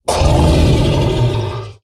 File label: Pack Kodo Attack